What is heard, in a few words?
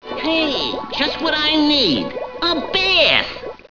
bath